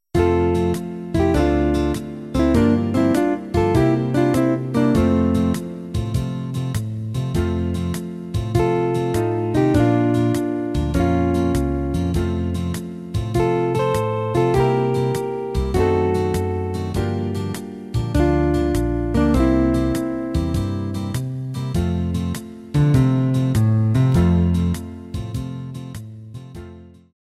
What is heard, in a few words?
karaoke